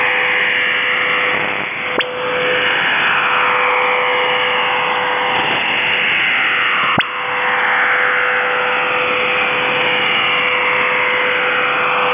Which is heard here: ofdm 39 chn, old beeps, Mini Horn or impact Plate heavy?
ofdm 39 chn